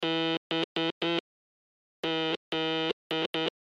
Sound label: Morse Code Bz Good Job; Technology; Blastwave